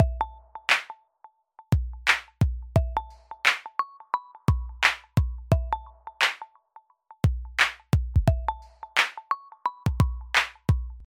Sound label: asus zenfone 5 ringtone